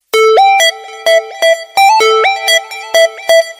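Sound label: funny music message ringtone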